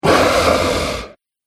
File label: Nightmare Beast Roar; Monster Sound Effects